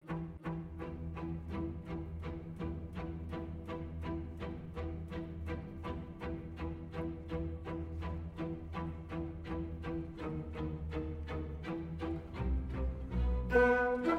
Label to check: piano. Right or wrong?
Right